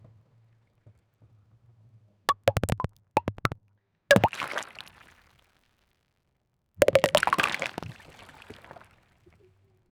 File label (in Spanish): pelotitas de goma de la, pool